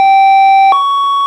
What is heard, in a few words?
hilo, sirens; code3 rls